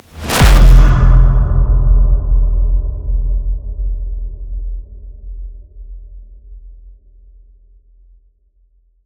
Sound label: impact dark; Virtual Riot; Impacts